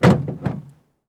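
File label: BMW HOOD close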